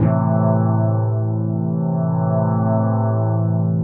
DEEPHOUSE ORGAN 125 A

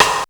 RIM

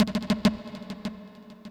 TOM INDST 140, Industrial, Drum

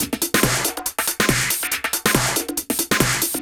TECHNO BEATS 140

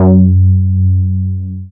BASS MINIMOO

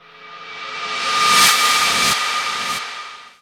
TECHNO FX 140

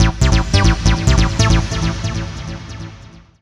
TECHNO EXTRA 140

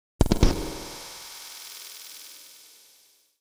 SPACE FX 140; SpaceSounds

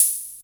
OPEN HH; drum

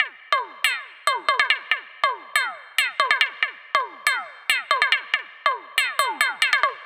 ELECTRO 140 A; ELECTRO EXTRA

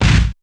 BD FX, bass drum; BASSDRUMS

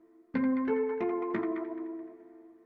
ETHNO 90 D

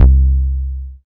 BASS MONO

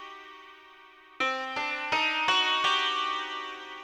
ethno, 125, A

